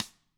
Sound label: CAMCO SNR; ILIO; Double Platinum Drums 1